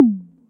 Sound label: reel stop; Back to 70s; wazdan